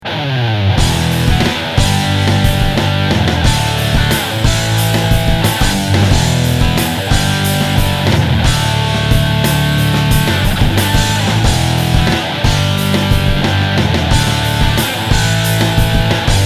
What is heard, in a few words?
metal riff